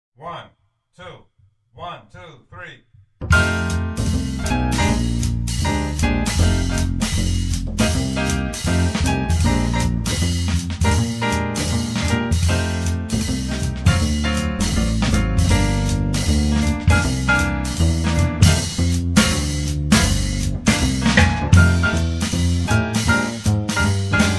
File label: Jazz Blues